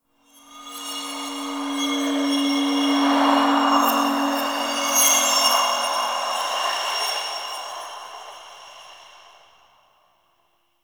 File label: Interior Cave Spirit Gust; Warframe; Duviri; Ambience